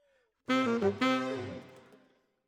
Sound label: Alto, RNR for piano